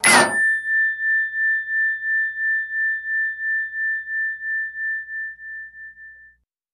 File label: Switch Floodlight